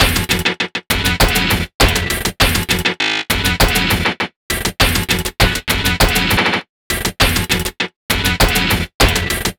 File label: 8 Bit Beat, Modular Electronica; Sonic Foundry (Sony Creative Software)